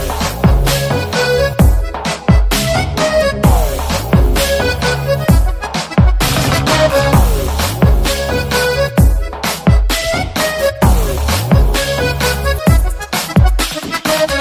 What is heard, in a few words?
BG Tone Telugu Ringtone